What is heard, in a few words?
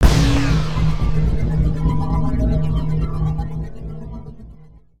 Spider Boss Spread Shot; creatures mechanical